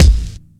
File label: crisp kickdrum sample a key, Royality free kick drum single hit tuned to the A note; kick drum samples